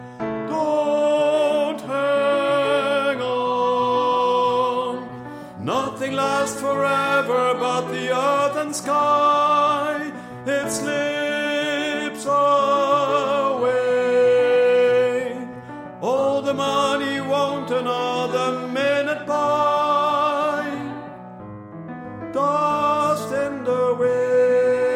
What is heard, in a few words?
bas